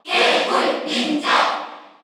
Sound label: Cheer Korean; Greninja; SSBU